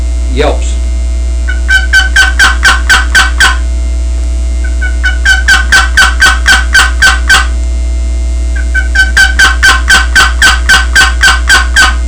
sidewinder yelps, turkey; Southland

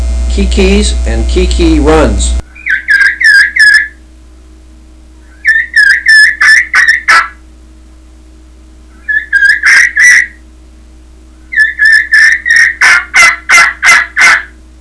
kee kee kee kee runs; ultra mag 3; dixie

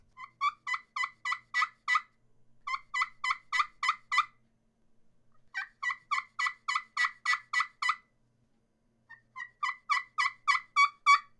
clear crystal yellowheart keekees, turkey; Southland Sounds

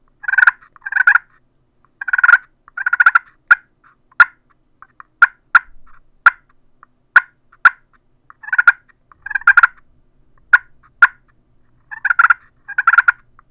super bronze clucks purrs, turkey; Southland

